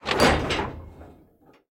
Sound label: gears rattle